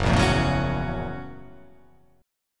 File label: F sharp 7th Sharp 9th